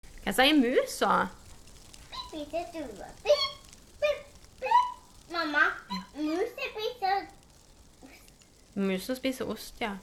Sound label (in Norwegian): Imitering av muselyd lang